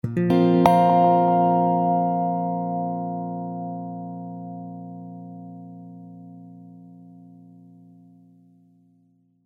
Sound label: Notification; 8-StringTones